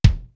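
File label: slammin, drum kit; East West; Global Sounds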